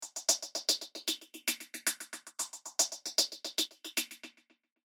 snares, Fills; Drum Kit; Crowdsourced Sample Pack

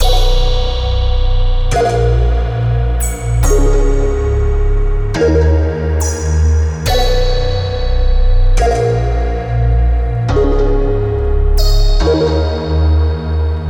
Chords [140 BPM], Instrumental Loops; Crowdsourced Sample Pack; TRUTH-HZ

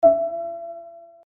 depressed boi, One-Shot Synths; Crowdsourced Sample Pack; TRUTH-HZ

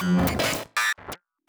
glitch, Digital FX; TRUTH-HZ; Crowdsourced Sample Pack

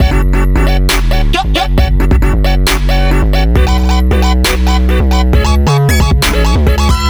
LOOP, Instrumental Loops; PARMA VIOLETS; Crowdsourced Sample Pack; TRUTH-HZ

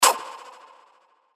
Perc, Hits; Crowdsourced Sample Pack; TRUTH-HZ

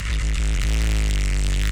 distorted static; TRUTH-HZ; Crowdsourced Sample Pack; Digital FX

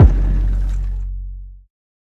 808 f#; texxture; Kicks; Drum Kit

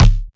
kick (C#); SerumMade; Drum Kit Kicks

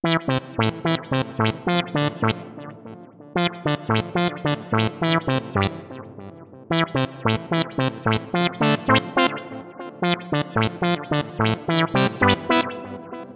Brass 72BPM Am; Nabla; Melody Loops; TRUTH-HZ; Crowdsourced Sample Pack

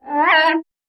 uhayuhh; Truth-Hz - 1-Hit KO Sample Pack

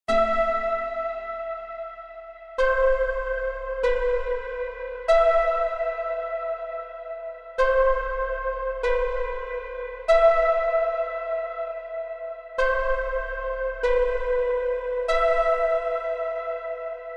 HAARP SYNTH 96BPM; Melody Loops; TRUTH-HZ; Crowdsourced Sample Pack

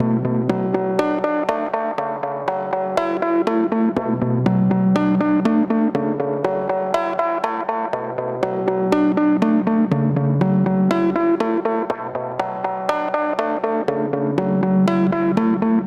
Scissor Bean 121bpm; Melody Loops; Crowdsourced Sample Pack; TRUTH-HZ

